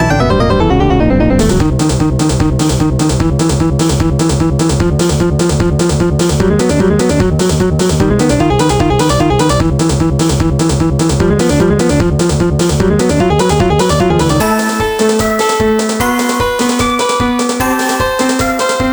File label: boss 150 bpm; super stone legacy